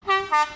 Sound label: Class 508 Horn